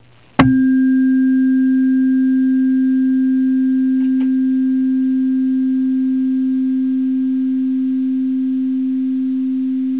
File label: brass